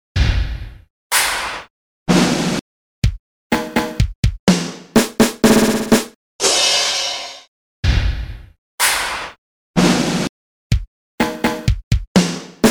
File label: drums